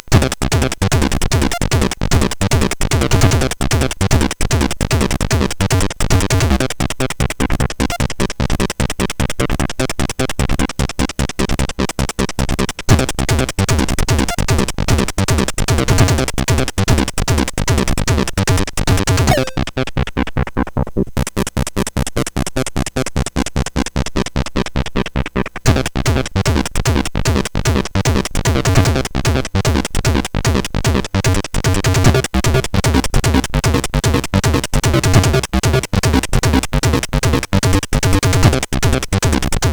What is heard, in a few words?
Techno Rock, sid CSG8580R5; Early Tunes